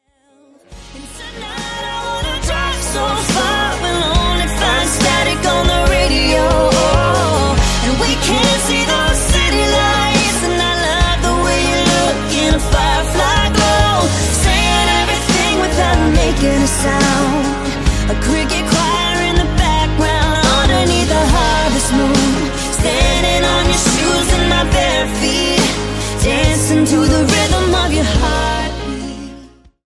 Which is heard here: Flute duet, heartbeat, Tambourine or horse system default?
heartbeat